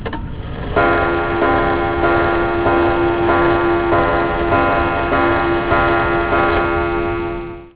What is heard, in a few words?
clock